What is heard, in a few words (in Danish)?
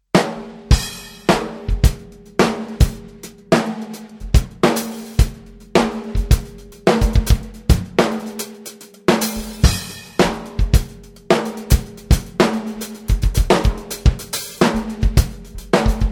tromme; trommesaet misti db52